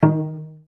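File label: cellos pizzicato D#2; Best Service; Peter Siedlaczek's Advanced Orchestra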